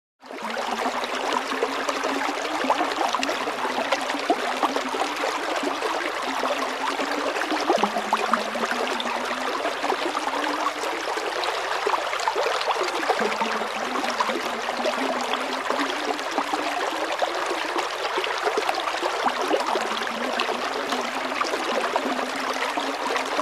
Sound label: Stream Small Gentle & Babbling Ascending Drips Close; water